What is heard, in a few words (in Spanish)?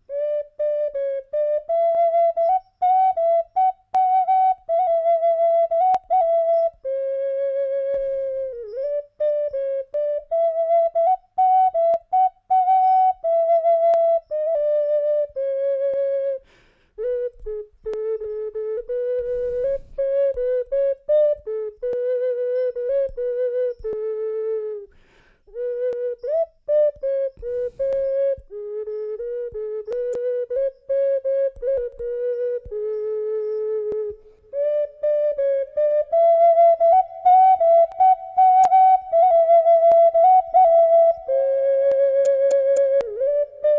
Ocarina Sola